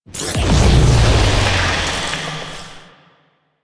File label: Corruption Fracture Close; Warframe; Missions; Zariman